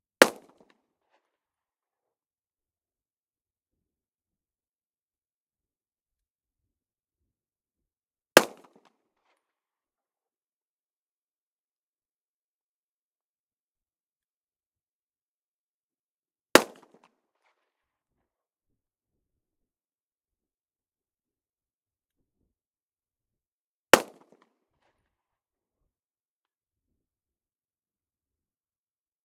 P38, Firing, 2m, Behind, Walther P38 semi automatic pistol; Pole Position